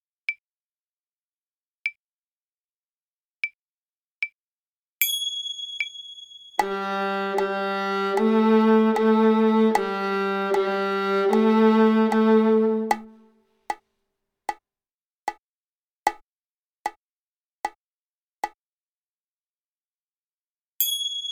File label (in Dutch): Papegaaienspel G snaar Altviool